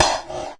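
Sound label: GATE SNARE, Gated Reverse Slapback Kit; drums; STYLE KITS (Hip Hop, Etc)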